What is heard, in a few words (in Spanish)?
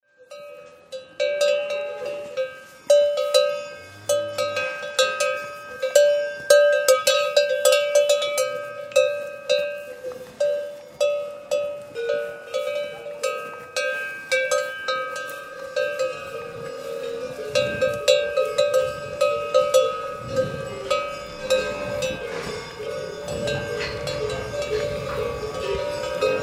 Feria de Pola; CarlosdeHita